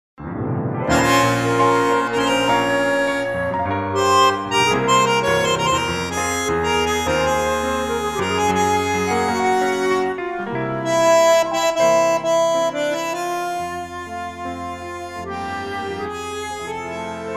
F play flute reference

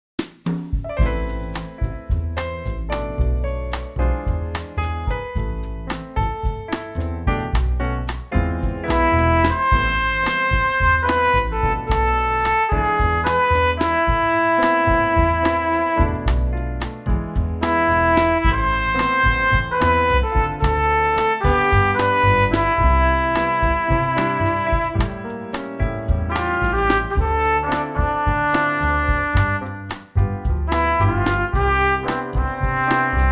Am, trumpet

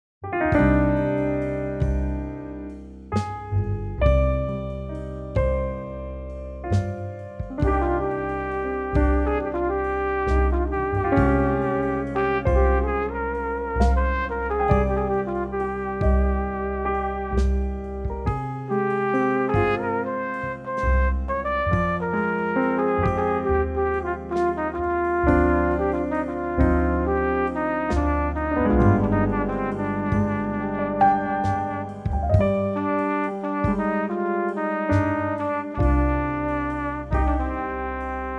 jazz

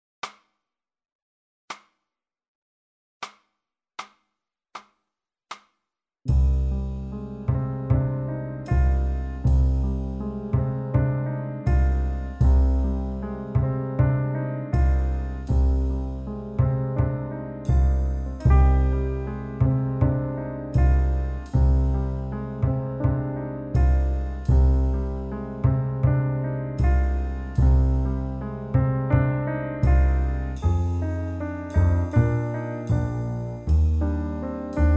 Cm trio

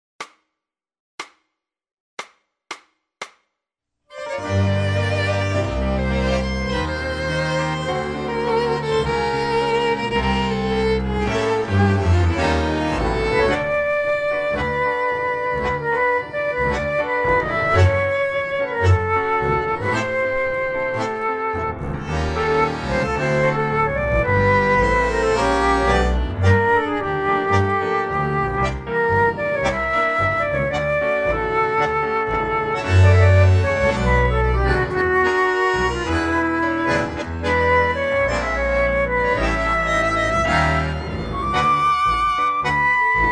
flute

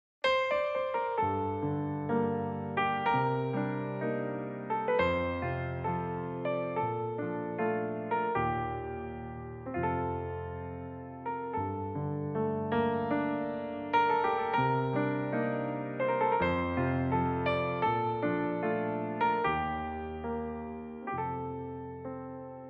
cello, Gm

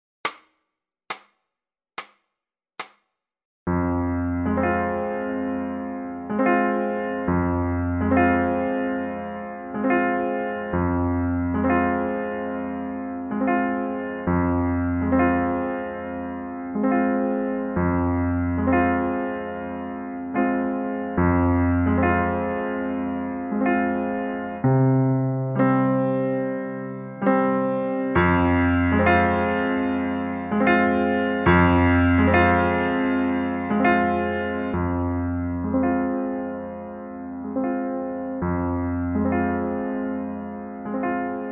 piano solo

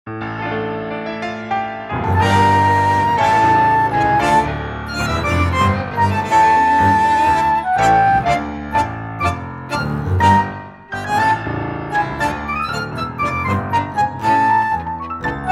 bandoneon Dm

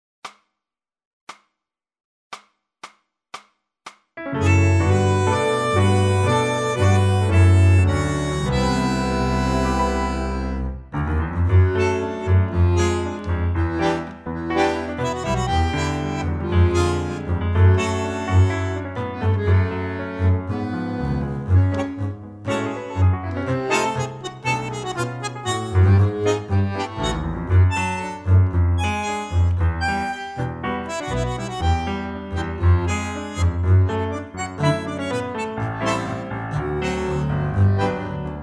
trio

